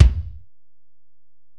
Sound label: Ac BD; Alesis DM-PRO